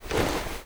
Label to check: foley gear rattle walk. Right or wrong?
Right